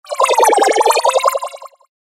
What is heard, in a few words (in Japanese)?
テレポート モノラル; 魔法効果音